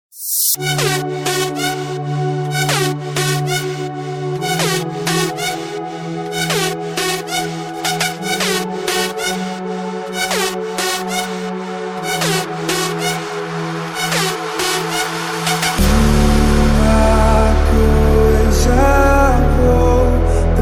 brazillian bass remix; cd cafeteria mdm e acai

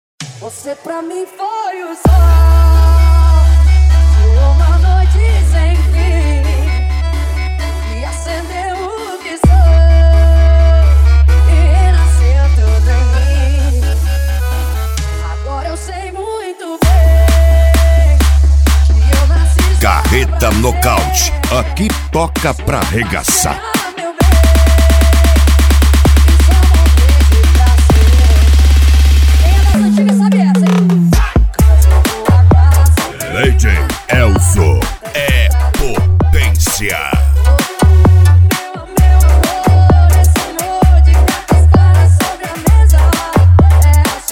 funk remix; carreta nocaute vol 07

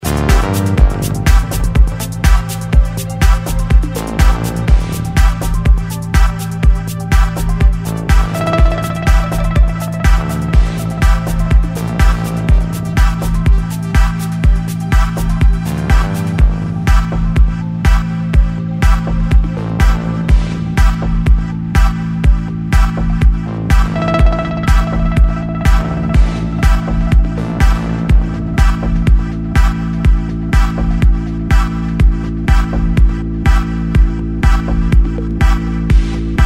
progressive melodic house

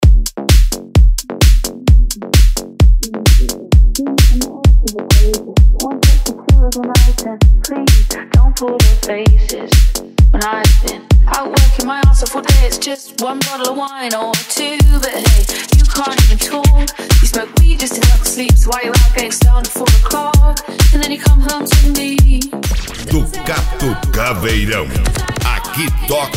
especial mega funk

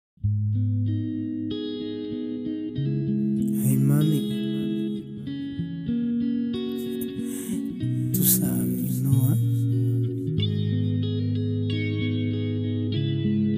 reggaeton mix; reggaeton mix evolution

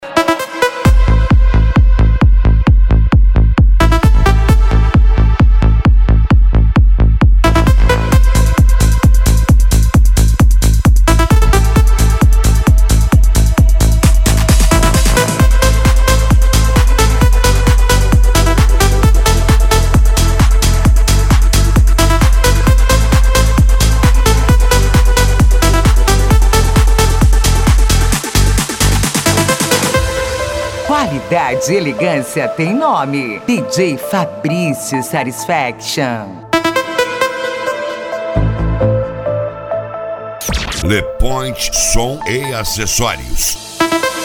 deep house

